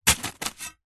industrial shovel space metal single dig into gravel stones; zapsplat